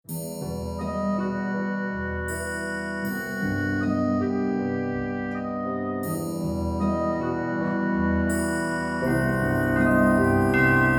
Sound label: Dramatic